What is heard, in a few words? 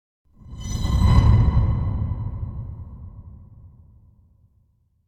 Whoosh Tone By Heavy Pushing - Finale; Hollywood Edge; sound designer's toolkit v2